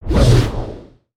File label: Full Swing; Summoner Valiant